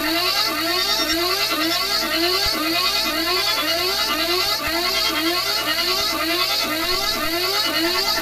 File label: drone warning loop; Titanfall 2